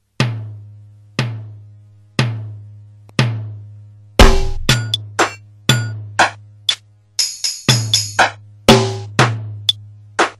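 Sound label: Metronome Track Slow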